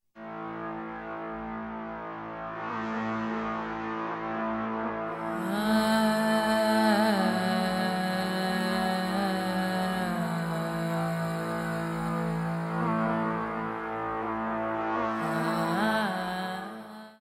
Guitar (outro)

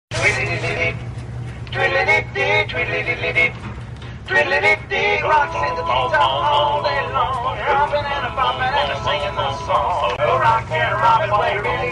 ringtone

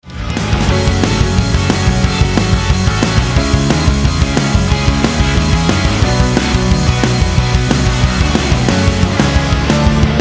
chrom med - chorus and transition

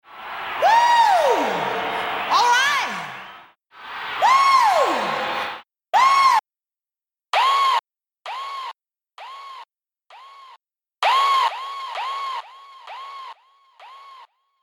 Shout ('wooo')

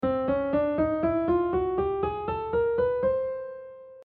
chromatic

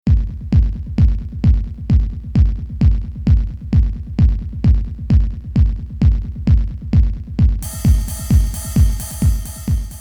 Beat

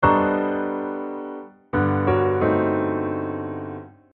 polychords with 3 chords

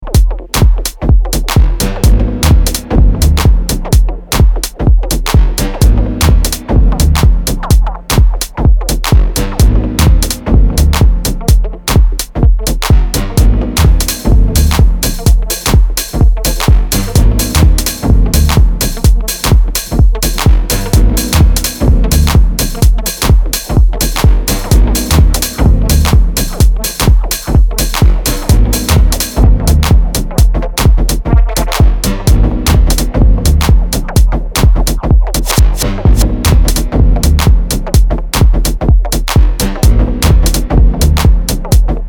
Dub

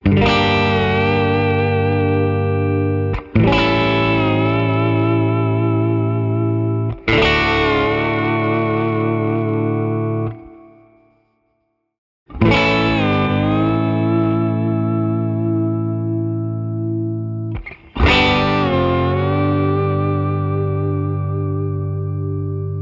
fake bar vib

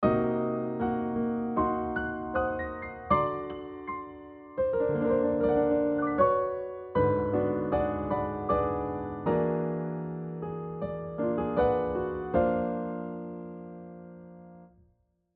non functional harmony

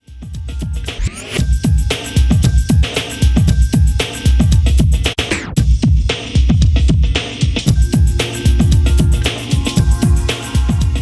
Beat

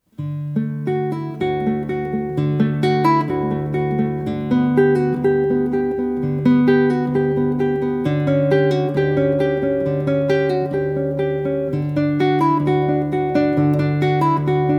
Prelude; Bach

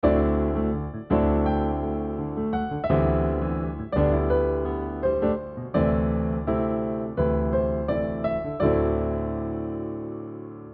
modal - pedal note

